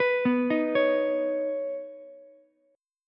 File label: B Major Add 9th, Ukulele; arpeggiated; 16,11,11,16; JGuitar